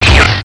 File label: beam blast